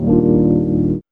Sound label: RHODES